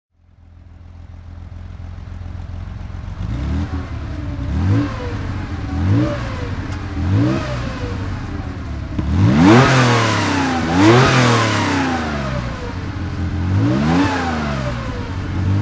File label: porsche 911 2.4 s rally throttle